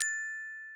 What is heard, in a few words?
gloken